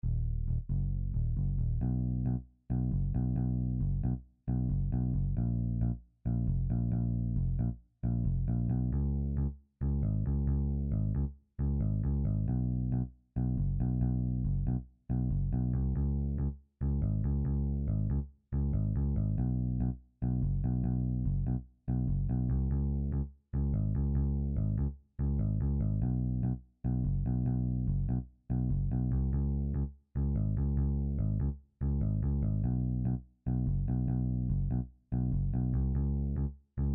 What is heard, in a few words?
bass jam